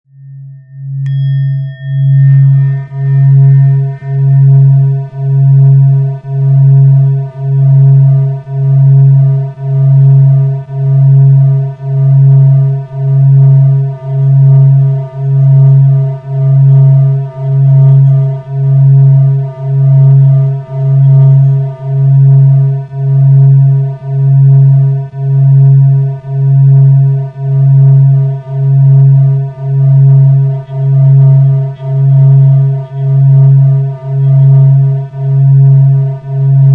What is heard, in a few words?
Binaural Beat Relaxation Meditation Music